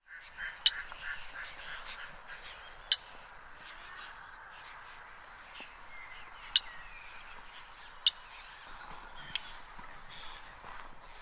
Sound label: Black tailed nativehen